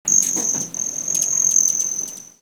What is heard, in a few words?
hum; 2013 colorado